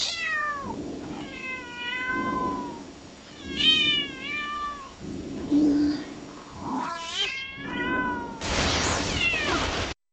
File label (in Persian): صدای گربه نر; صدای گربه برای جذب گربه